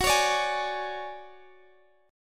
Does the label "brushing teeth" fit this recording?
No